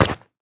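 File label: baseball sounds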